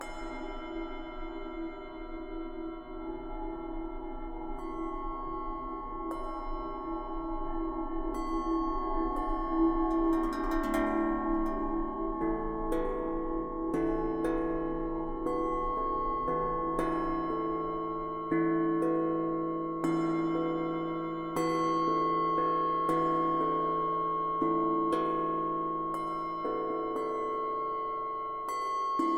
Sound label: Mysterious - Meditation Chamber; Dark Elfs Adventure